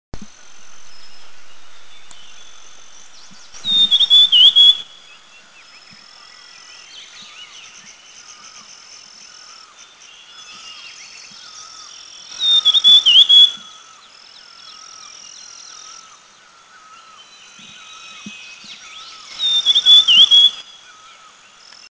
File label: Beautiful Sibia; Eaglenest; India